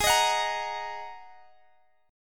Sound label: G sharp 7th Suspended 2nd